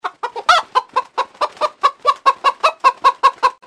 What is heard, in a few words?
chicken start